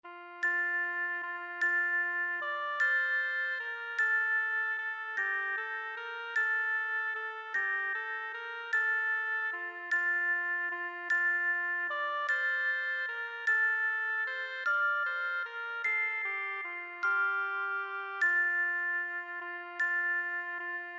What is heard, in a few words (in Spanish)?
INST; 3 barcos Adviento